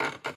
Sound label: Creak Metal Sign Squeak; SFX Kit